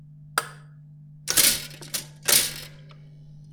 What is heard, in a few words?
Toaster, foley; M8; percussion and foley